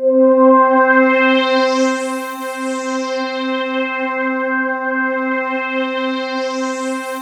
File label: WIDE PAD; Rubber Chicken Software Sample Bank; CYPERPUNK 2